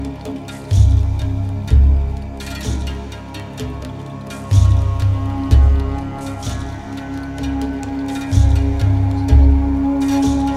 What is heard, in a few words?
trance; Sessions